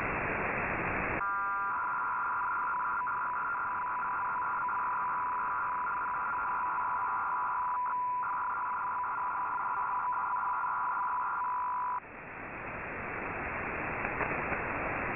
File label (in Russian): fsk-2 raznos 400 gerc; skorosti 40